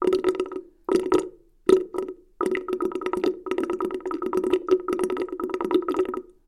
voda spokojnij monotonnij blizkij

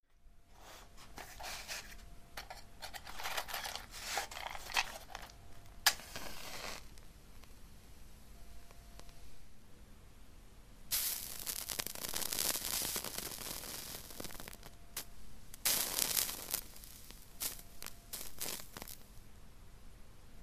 spichki i svecha

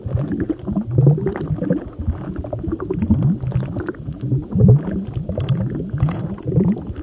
voda burnii nizkii priglushennii

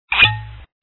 metallicheskaya probka

